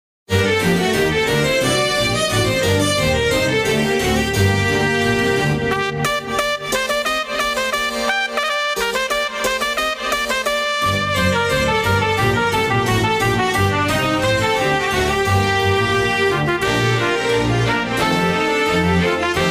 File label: Baroque Concerto